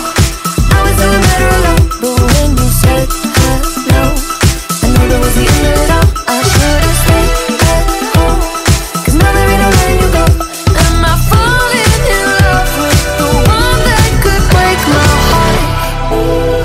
english ringtone; english ringtones

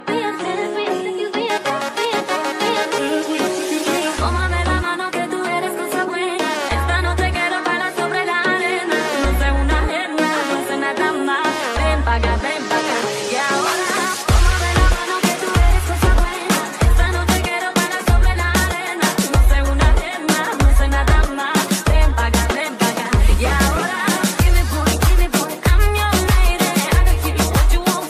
music ringtone

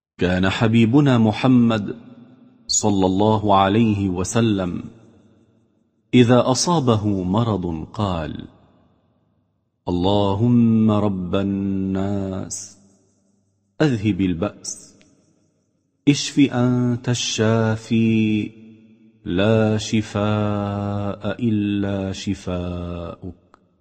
islamic ringtone; islamic ringtones

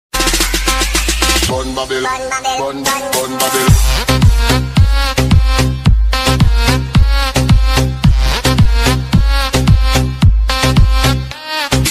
dj remix ringtone

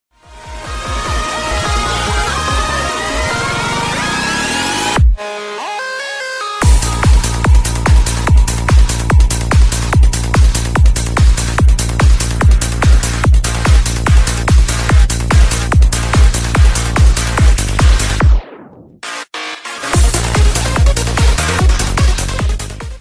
spin bass ringtone

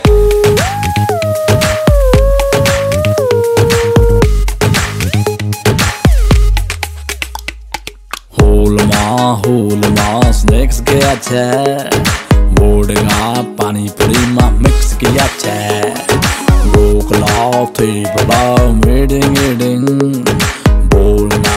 hindi music ringtone

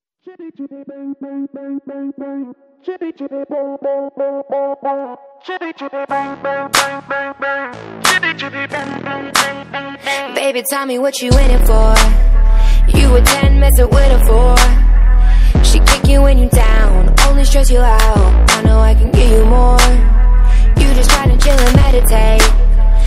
trance mix ringtone

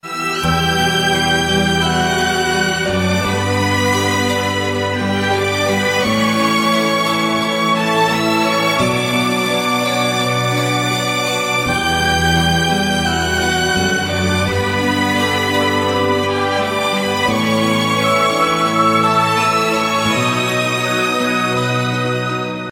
ringtone

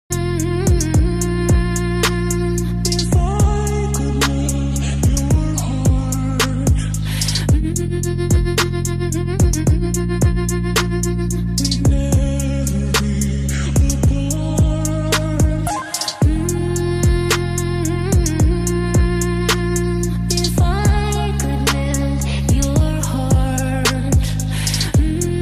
remix ringtone; english ringtones

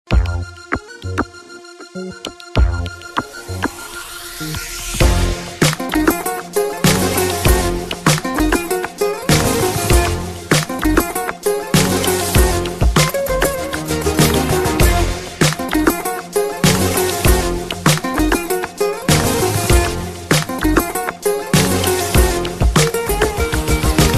beautiful music ringtone

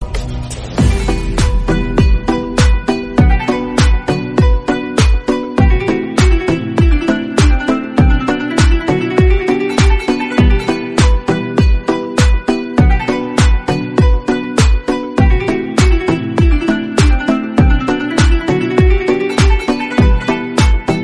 cool new music ringtone

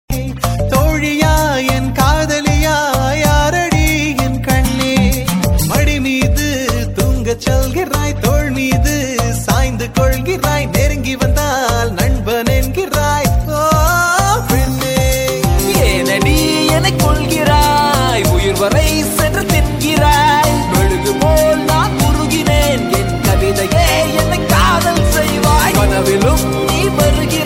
tone lovers call ringtone

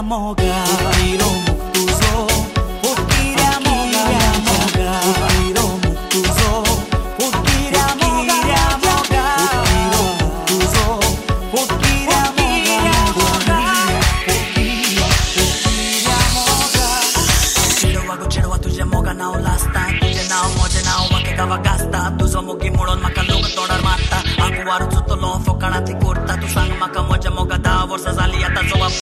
best music ringtone

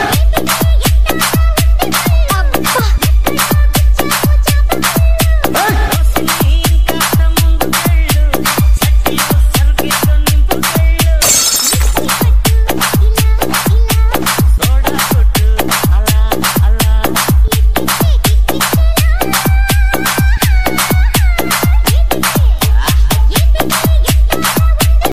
telugu new dj song ringtone

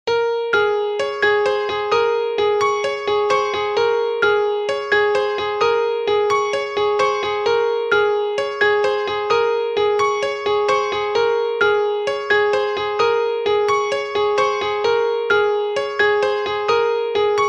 xiaomi redmi plus ringtone

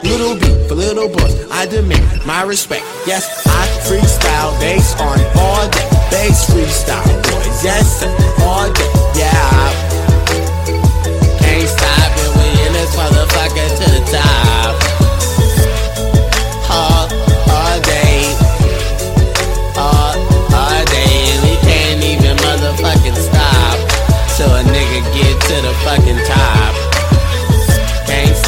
based robot freestyle ringtone

